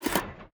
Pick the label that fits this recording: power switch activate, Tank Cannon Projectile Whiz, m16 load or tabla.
power switch activate